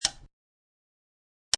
Lamp Pull On Off, Single sound of a lamp being pulled on or off; Lamp Sound Effects; Household